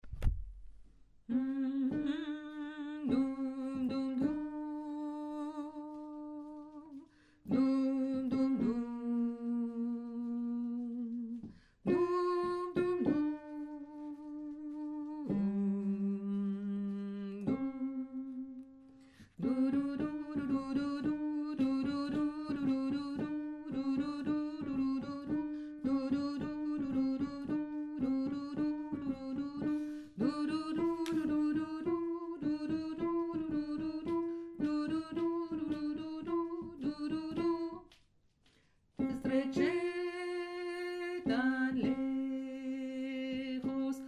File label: alto